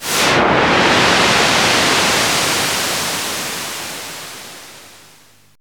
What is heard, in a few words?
synth wave; Zero-G Ambient 1; SYNTH WAVES